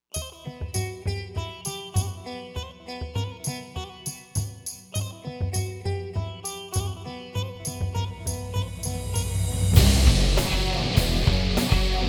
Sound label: vg 88v2; virtual reality